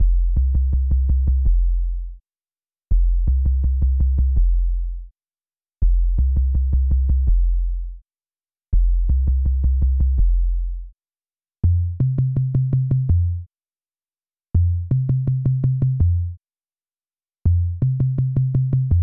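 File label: 165 BPM C Minor Bass, DnB; Melodies; Loop Stems; Chanced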